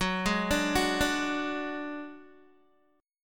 Gb Suspended 2nd Sharp 5th Guitar 4E,4A,5D,5G,5C,6F; Gbsus2#5 arpeggiated; 14,11,12,11,14,x